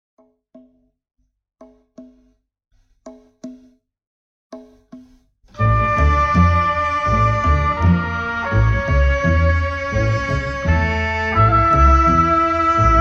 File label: Orchestra, Violoncelle & Abua